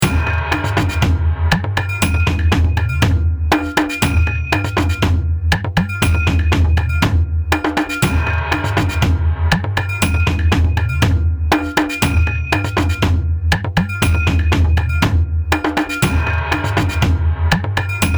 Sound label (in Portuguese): Trilha Suspense Leve